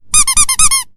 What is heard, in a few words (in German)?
Klingelton Quietscheentchen; whatsapp